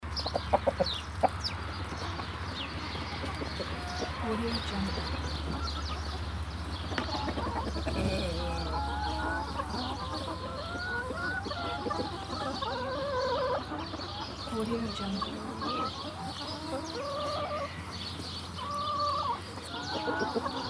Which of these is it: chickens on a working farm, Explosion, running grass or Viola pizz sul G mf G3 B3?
chickens on a working farm